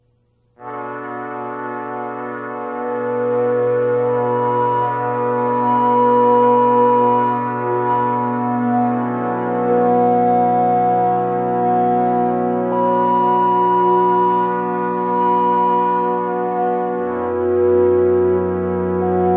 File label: Casio + P600 hoot, horns; Casio + P600 II